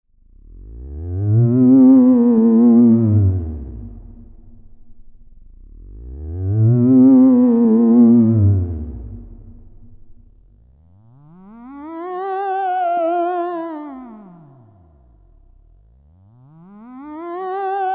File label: Ghostly Moans